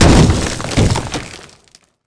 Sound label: concrete